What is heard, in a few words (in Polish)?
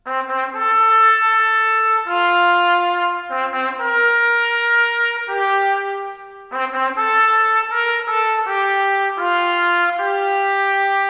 hejnał; olecko